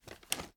reload start, weapons; gmod; viper

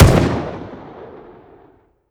fire; gmod; viper; weapons

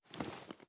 kilo98 reload empty cloth; gmod; viper

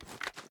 reload fast xmag arm; gmod; viper

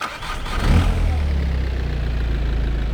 engine start, vehicles; rav4 19

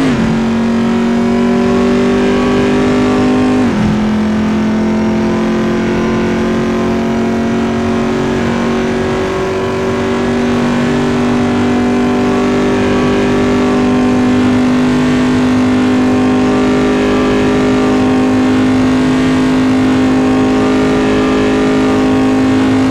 fourth cruise, vehicles

